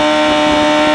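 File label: low loop, Engines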